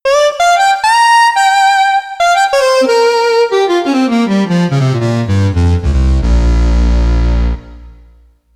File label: Mod Sinker